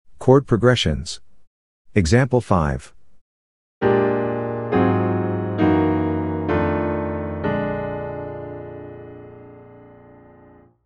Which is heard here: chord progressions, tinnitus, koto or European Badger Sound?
chord progressions